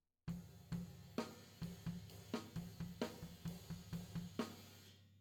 Floor

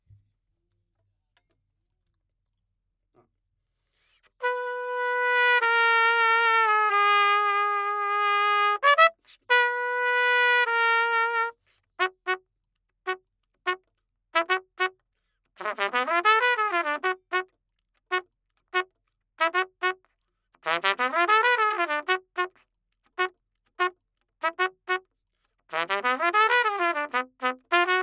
Trumpet

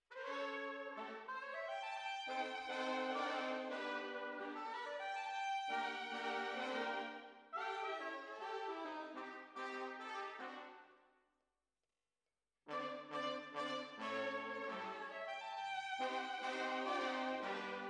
Tromb ASS

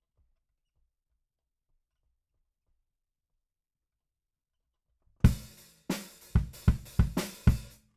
BD Out

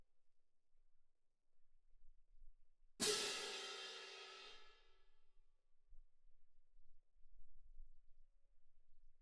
Cymb1 Main

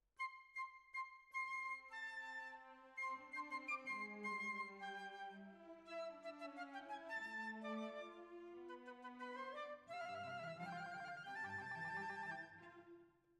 Oboe

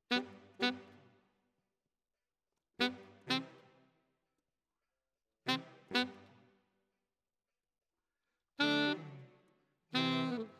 Alto, RNR for piano

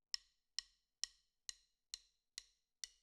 Snare Top

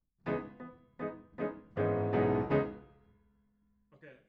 Piano A

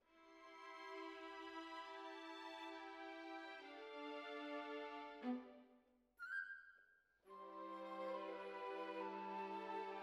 Cellos Over

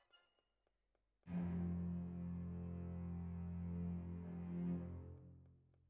Clarinet

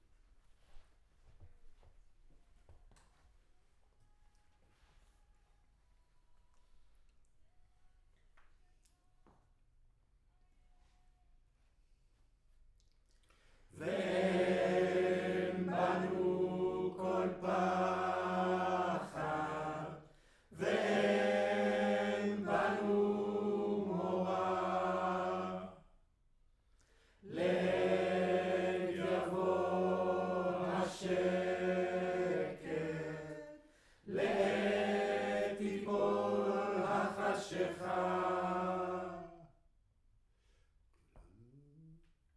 Room, Choir

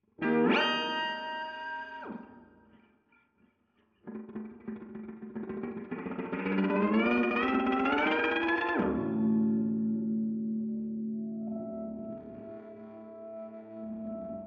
GTR Royer

